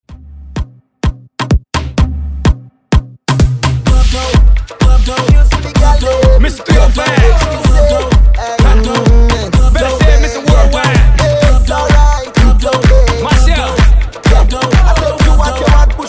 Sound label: Re Drum 128 Bpm